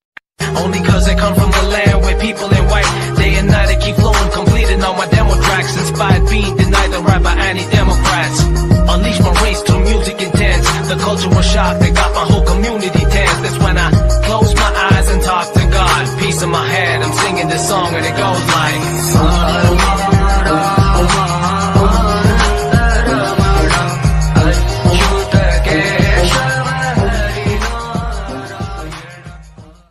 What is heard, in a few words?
achyutam keshavam hari narayana ringtone narayana bgm ringtone